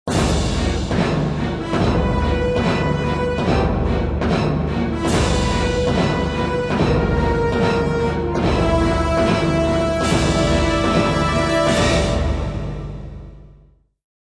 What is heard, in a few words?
Industrial Combat; Civilization Revolution; Xbox